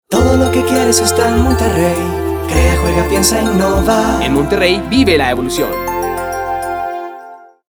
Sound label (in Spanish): JINGLE NAVIDAD; MONTERREY